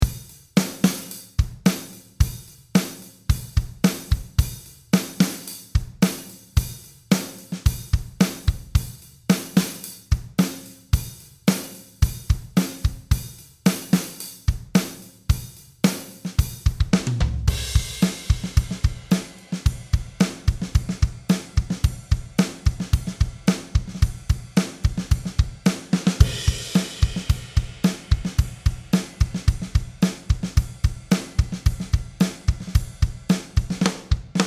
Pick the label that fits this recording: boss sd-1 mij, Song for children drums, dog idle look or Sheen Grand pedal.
Song for children drums